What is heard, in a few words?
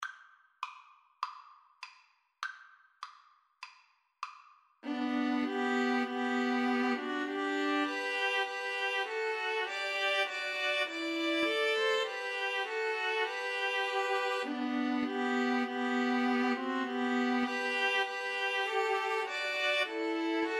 hark, String trio